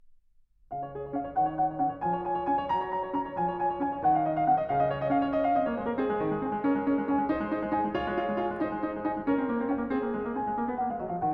Well-Tempered Clavier, Book 1 Prelude No. 3 in C-sharp major; BWV 848; Bach